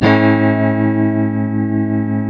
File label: TELE CHORDS MAJ A#, Guitars; Spectrasonics; Hans Zimmer Guitars VOL-1